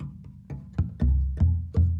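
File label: Calm Far, music; from dust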